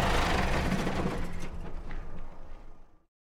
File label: stop, tractor; stardew valley mods; TractorMod